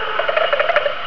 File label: chest b, primate